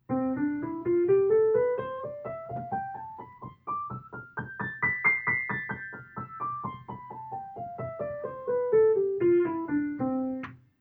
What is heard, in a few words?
piano Scale